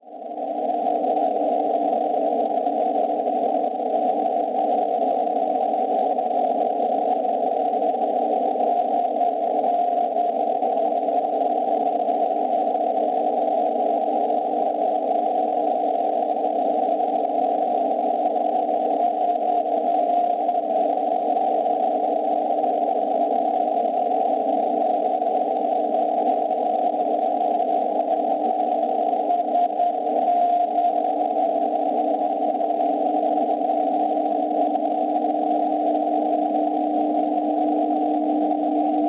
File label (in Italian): NDB, St Yan Francia 430 kHz; SN; Schwarzsee